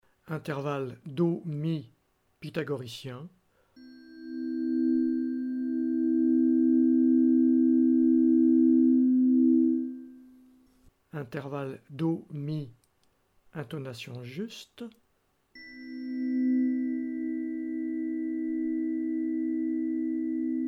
set pythagoricien intervalles pythagoriciens et intonation juste, diapasons

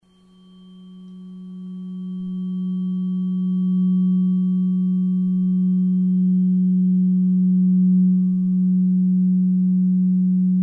chakra8 jupiter diapason therapeutique

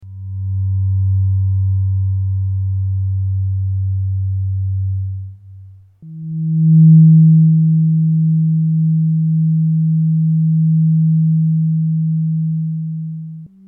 set diapasons therapeutiques 2e 3e octave harmonique

